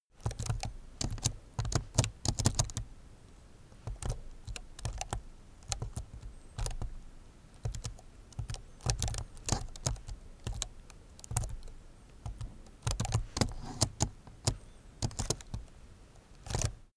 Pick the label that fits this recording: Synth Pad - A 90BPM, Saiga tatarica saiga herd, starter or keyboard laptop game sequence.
keyboard laptop game sequence